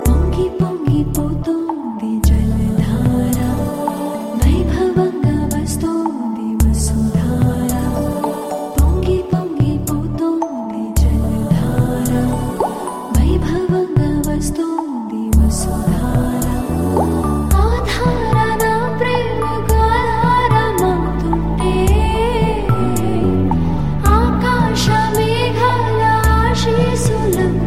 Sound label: Telugu Ringtone